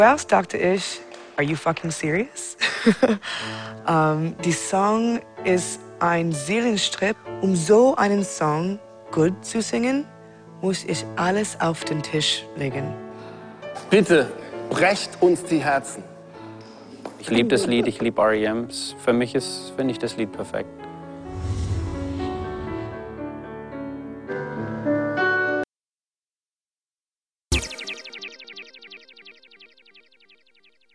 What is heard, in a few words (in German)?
Klavier test